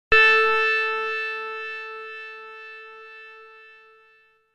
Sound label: Grandfather's Clock